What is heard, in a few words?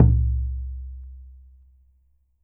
POOK, KOREAN DRUMS; AKAI S6000 CD-ROM; WorldSounds